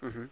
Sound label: m hm, Conversational Grunts in English